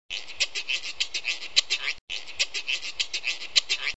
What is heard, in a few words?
guiro; reciclar tocar